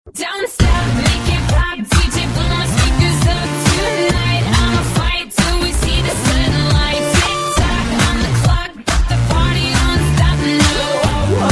Remix; Sony Walkman

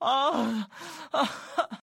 Sob; Yoosung

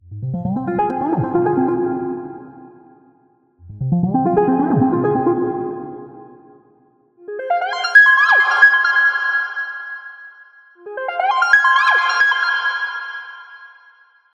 Minimal

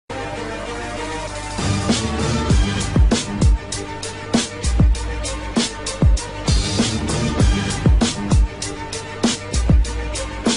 Dubstep Music

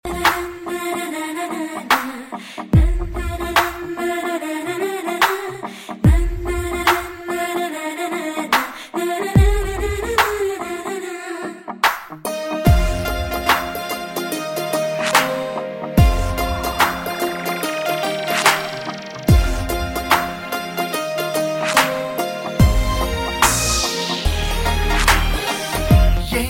Musical Sms

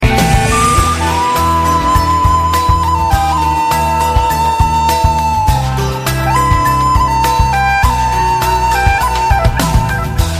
Romantic Tune

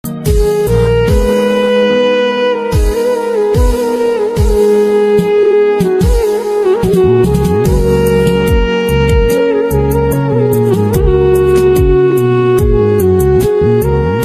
Sad Ringtone

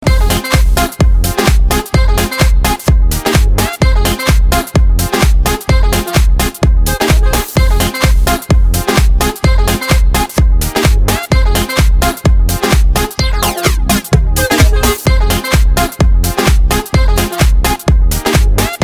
Saxo World Beat

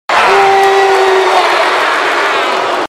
yell; Roman Reigns

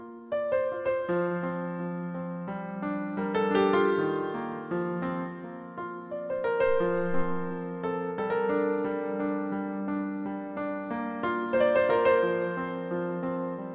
New Age

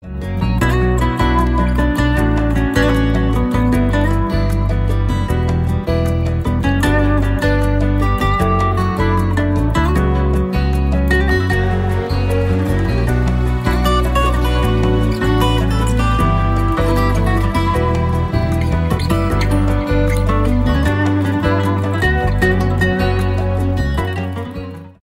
Guitar Romantic

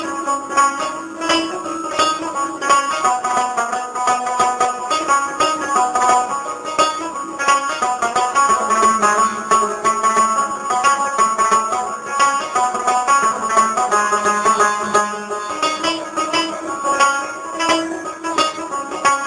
Rabab

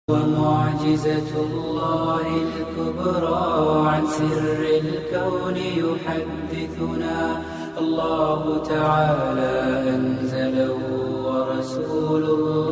Quran

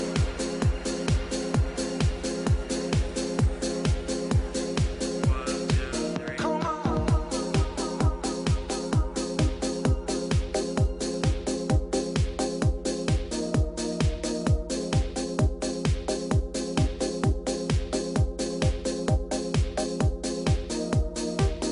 Chill Techno Tone

